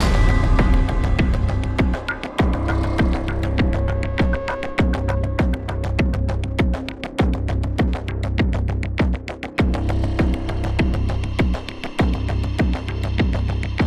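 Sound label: music, bass beat loop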